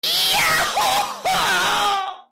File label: scream; pizzelle